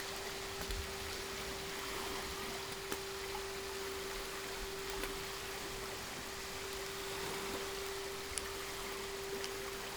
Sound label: No Ship, helicopter